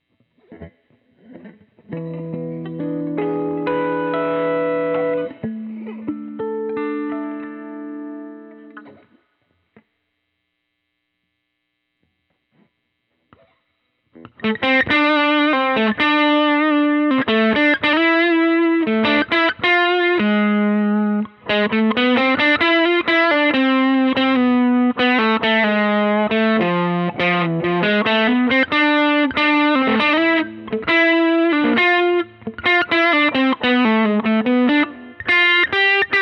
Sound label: Gtr Solo